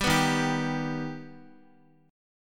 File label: Eb Augmented Eb, Guitar; Eb+ strummed; JGuitar